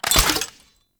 Sniper Alt Fire Mech React; DE; Warframe; Frumentarius; Exalted Sniper

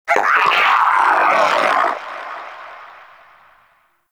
Vocal Alerted, Shrimp Lantern, Creatures; Baliq

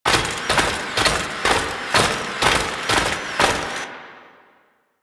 Melee Run Loop; Warframe; Necramech

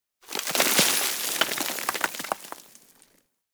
Generic Explosion Rocks Medium; Warframe; Explosions; Surface Hit Effects

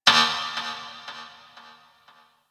Ship Energy Knock; Warframe; Corpus Ship Remaster

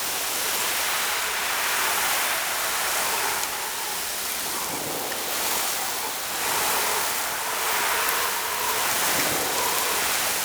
Sand Swirl Loop; Warframe; Man In The Wall

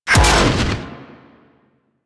Impact; Mac Rush; Warframe; Warframes; Runner

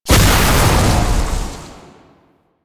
Explosion Medium; Weapons; Warframe; Duviri; Incarnon Adapters

